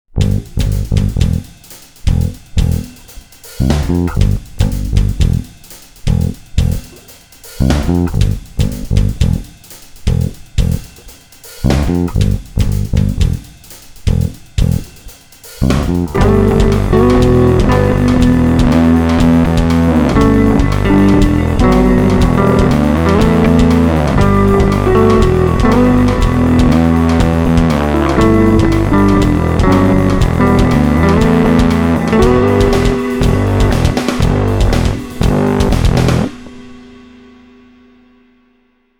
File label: MarkBass Distorsore and Revebero